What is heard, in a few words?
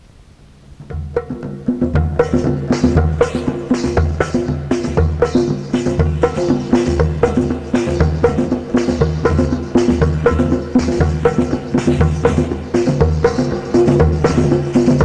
Ritme Bandari; Bandari Jazz